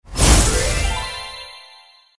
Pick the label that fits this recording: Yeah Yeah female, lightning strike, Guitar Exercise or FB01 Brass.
lightning strike